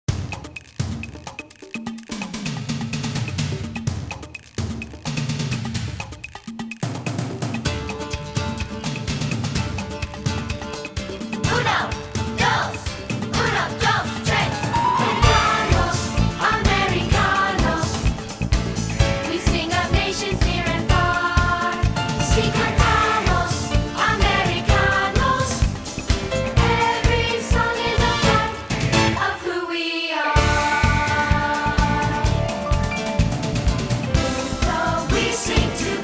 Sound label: cantamos americanos vocal